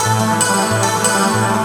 Seq Crystal, Drum & Bass; Sonic Foundry (Sony Creative Software); Knutrix Drum & Bass

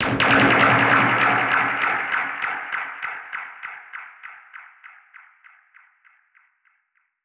Perc Hit, dub percussion one shots; musicradar

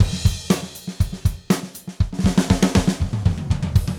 Gretsch groove 120 BPM, 1967 Gretsch Kit drums

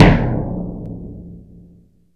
concert bass, Percussion; SN-R8-01 Contemporary

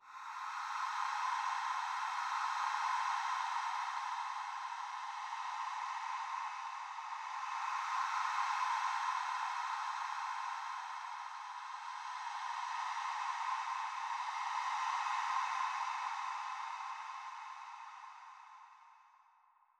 Air, ambient or nature

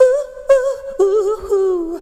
VOX SUNG; Megabass Remix! 2

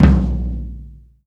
TOM, Laidback; Sonic Foundry (Sony Creative Software); Loops & Samples Drum Tools

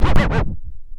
ST SCRTCH, SCRATCH; AKAI S-Series CD-ROM Sound Library VOL-4

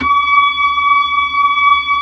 B-3, Blues

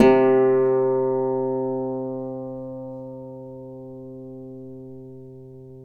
Celtic Harp, Plucked Strings

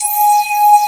DG WAVE, DIGI SYN

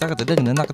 INDIA R, IND ENS; Spectrasonics - Heart of Asia CD2

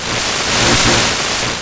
DISTO; USB Soundscan vol.33; AKAI; Disjointed Textures

